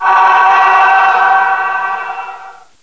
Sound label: scream